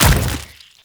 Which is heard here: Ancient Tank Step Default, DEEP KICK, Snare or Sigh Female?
Ancient Tank Step Default